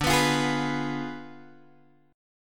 D Diminished 7th Guitar Drop D, chord

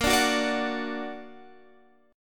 Bb Augmented, Guitar, Standard; strummed; 18,17,16,19,19,x; JGuitar

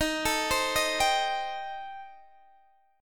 Eb 6th Flat 5th Eb down Guitar DADGAD

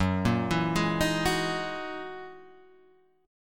Gb 13th Gb down Guitar Half Step Down

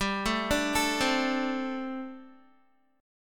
G Suspended 2nd Suspended 4th, Guitar; Gsus2sus4 arpeggiated; 14,12,14,14,12,x

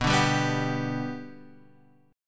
B-Minor Sharp 5th, Guitar, Drop D

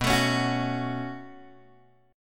B 7th Suspended 2nd, Guitar, Open G

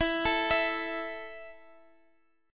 A 5th E, guitar chord

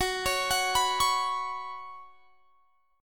Gb Suspended 4th; arpeggiated

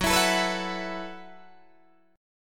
Gb Minor 11th Gb Guitar DADGAD

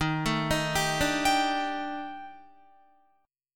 Eb Major 7th Flat 5th Eb down Guitar; arpeggiated; chord

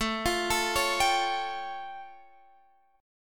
A Augmented 7th A Guitar Standard; arpeggiated; x,12,15,14,14,15; JGuitar

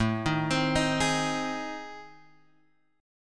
A Minor Major 7th Flat 5th, Guitar Open G

